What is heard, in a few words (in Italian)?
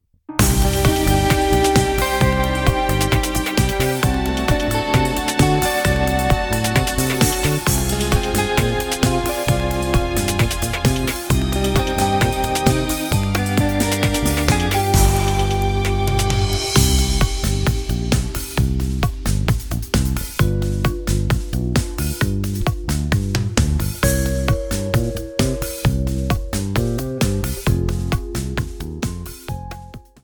NOCHE ENCANTADA base, bachatango